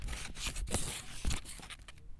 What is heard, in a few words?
Smooth Texture; BB Retail Therapy Sample Pack